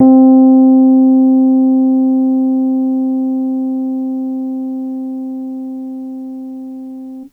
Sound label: Piano Rhodes c3 soft; TrashLibrary